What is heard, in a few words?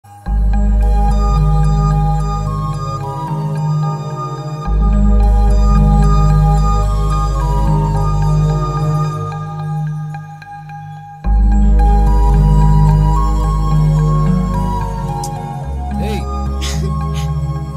love bgm